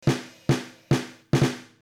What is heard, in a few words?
interpretive drums